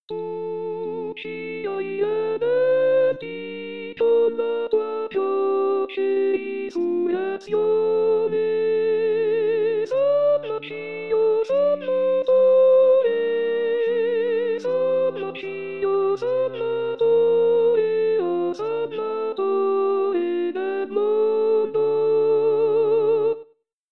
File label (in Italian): Tu Ci Hai Redenti Soprani; pasqua